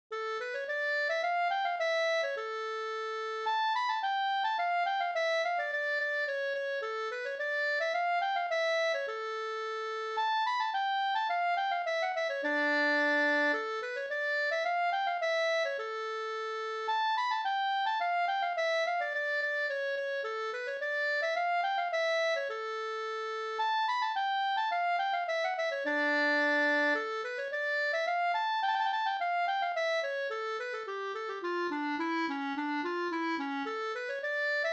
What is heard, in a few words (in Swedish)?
polska; Ljustorp Mp